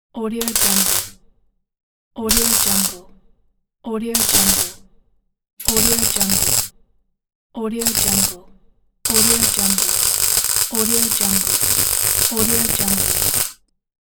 welding; grbicdanilo

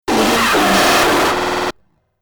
droid rust bucket

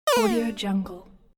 8 bit fail

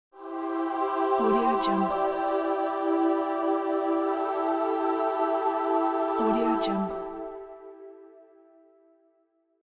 heaven angels choir

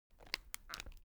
object case spectacles open soft; smartsoundfx

